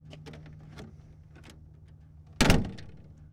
Plymouth Door Open Close; Sony Pictures Sound Effects Series; Period Vehicles

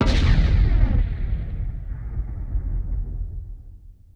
WWII Cannon Blast, Period Combat; Sony Pictures Sound Effects Series

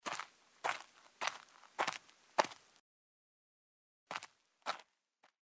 Footsteps; Age of Empires III; cinematics

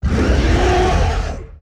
Hybrid Growl, Vocals - Monster

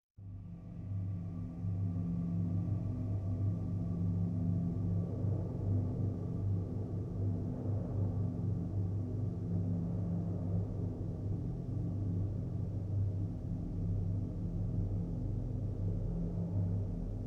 low wind & tone atmosphere; Sound Design - Fantasy; Sony Pictures Sound Effects Series

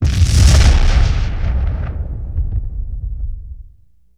Explosion Single Large; Sony Pictures Sound Effects Series

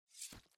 Stab; Microsoft Studios; Age of Empires III - Complete Collection; cinematics

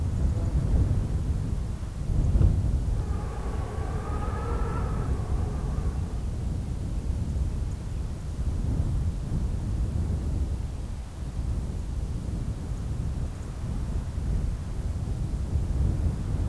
siege ambi; battlecastle

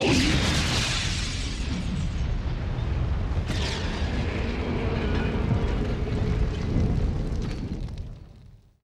fire blast, natural elements; Sony Pictures Sound Effects Series

